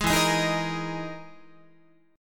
E-Major Sharp 11th E, Guitar; EM#11 strummed

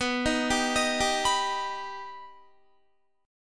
B Augmented B Guitar, chord; arpeggiated

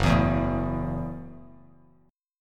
F sharp Minor 7th F sharp, Bass Standard; strummed; JGuitar

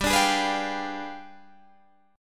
G 7th Flat 9th, Guitar, Standard

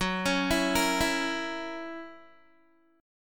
F sharp 7th, Guitar; F#7 arpeggiated

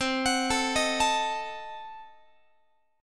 C Diminished 7th down Guitar; Cdim7 arpeggiated

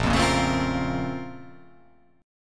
A sharp Minor Major 9th

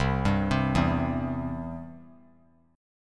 C Major; arpeggiated; JGuitar

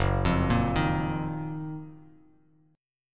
F sharp Major 13th Bass Standard; arpeggiated; JGuitar

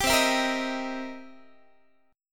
C 7th Sharp 9th, C, down, Guitar; C7#9 strummed; 22,20,19,20,19,x

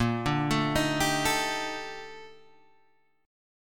Bb Major 13th, Guitar, Open G

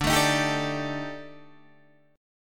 Eb Major 7th Flat 5th D

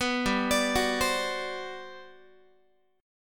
G Major 11th, Guitar; GM11 Chord

